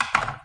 kopsahdus, Clangy Crunchy Foundsound Kit

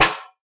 kansi, Clangy Crunchy Foundsound Kit

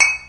pullo, Clangy Crunchy Foundsound Kit

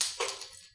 outo, Clangy Crunchy Foundsound Kit

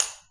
kalske, Clangy Crunchy Foundsound Kit

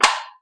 kansi, Clangy Crunchy Foundsound Kit

